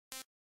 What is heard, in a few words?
menu move; mgs1